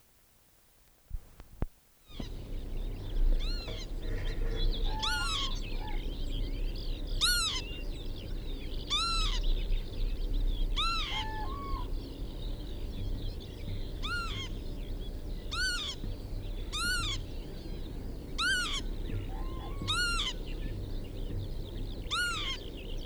kiebitz, vogelstimmen; karl-heinz frommolt; deutsches tierstimmenarchiv

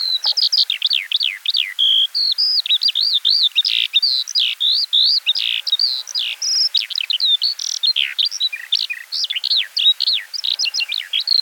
feldlerche; vogelstimmen; lars lachmann

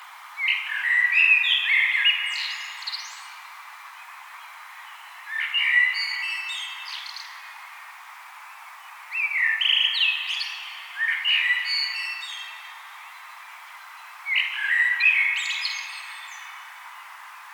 amsel gesang; vogelstimmen; nabu; lars lachmann

